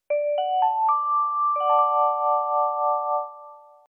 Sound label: start, tones